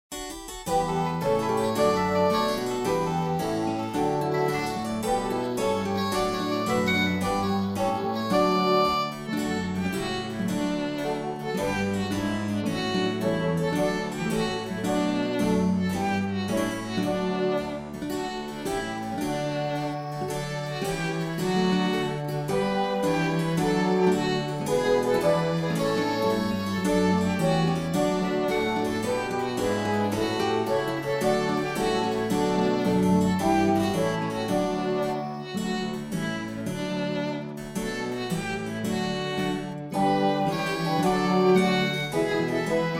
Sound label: Instrumental